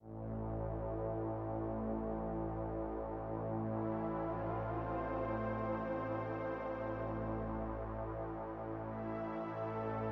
UI MENU PAD; Vostok Inc.

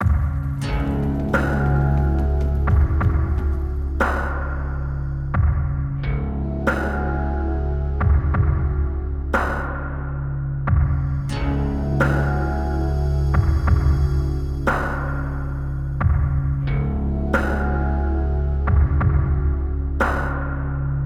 Fishing Village Formation

